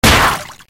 Shell Hit; mega boulder rampage

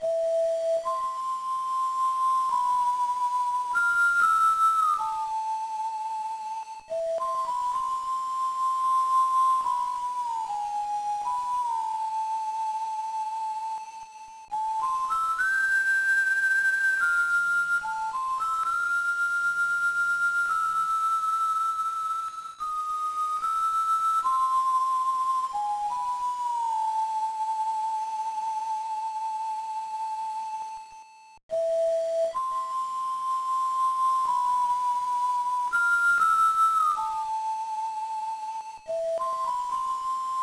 Magic Flute C; Boktai 2 Solar Boy Django; GBA

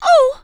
Female Surprised

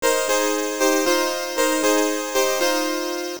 Victory; Nobunaga no Yabou Zenkoku Ban; PCE Super CD-ROM2

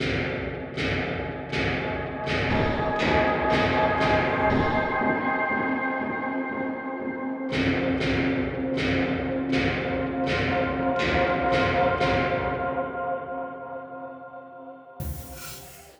Success Tonal A4; Insanely Twisted Shadow Planet

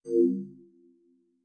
Game Boot, playstation 2 system music

